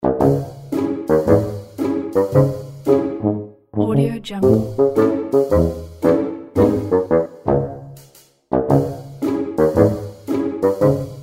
comical funny spy music

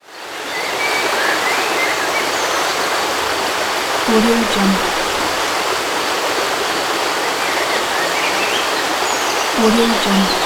stream and birds

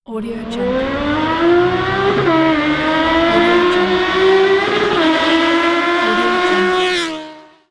motorcycle

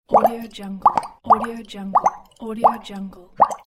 bubbles

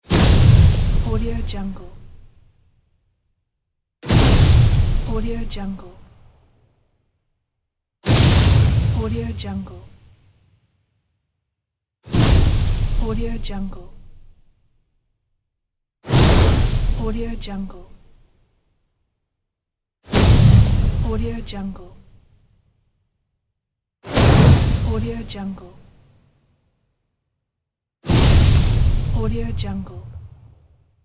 fireball explosion

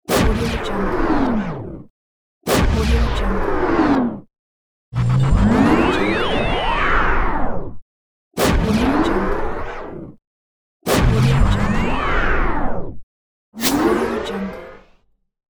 cinematic whoosh sound effects

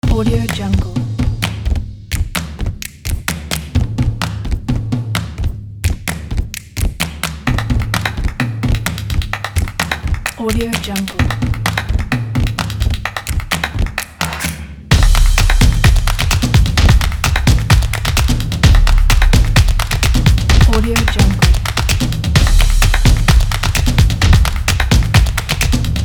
a clap

